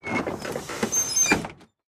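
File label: school bus door open; brakes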